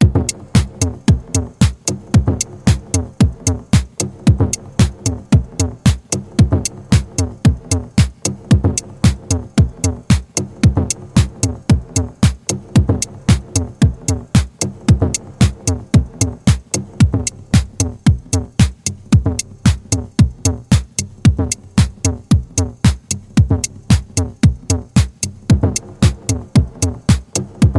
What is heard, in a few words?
rhythm